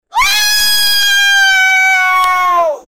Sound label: one kid screaming scared